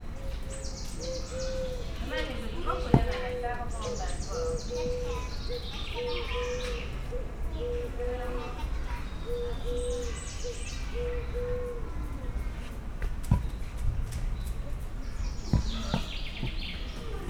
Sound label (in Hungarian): patadobogás, magyar parlagi szamár; szegedi zoo 2011